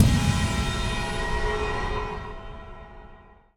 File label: Hi Hit